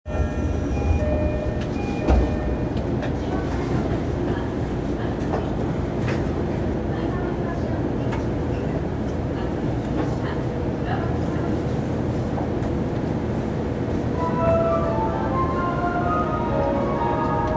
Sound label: train sounds; 南北線王子北